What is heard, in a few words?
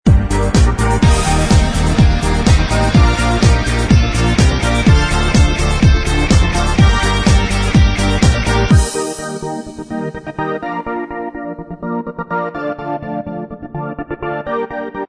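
instrumental, backing track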